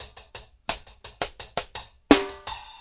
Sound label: 085 sparse rock, drum loops